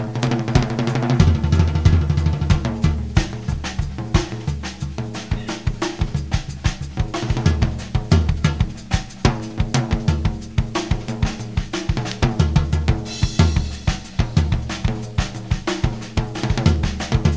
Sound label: DRUMSOL